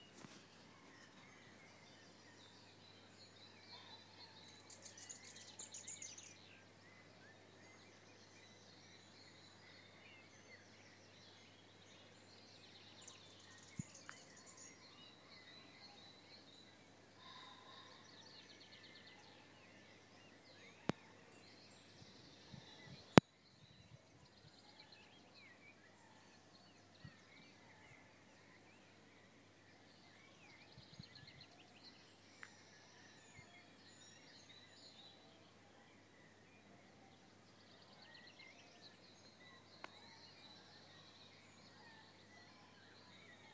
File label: dawn chorus at uppark